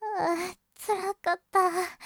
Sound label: section fail; zadymiarz onymki